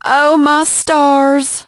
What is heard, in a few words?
die; Piper